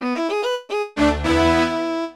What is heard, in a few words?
LEVEL COMPLETED; HAUNTED TREASURE